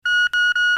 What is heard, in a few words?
Metal detector start beeps; foley